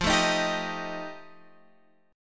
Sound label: F 9th F Guitar Open F, chord; strummed; JGuitar